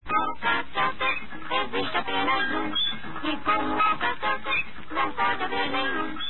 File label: jingle; alka seltzer